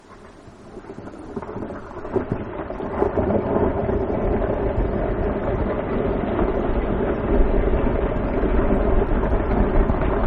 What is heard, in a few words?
Steady Rock Slide on Yentna Glacier; alaska acoustic highlights; GEOPHONY